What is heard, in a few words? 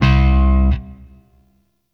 Shawzin Oct Two C; Warframe; Sentient Shawzin